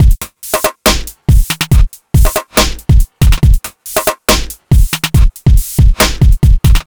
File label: Move On, 140 bpm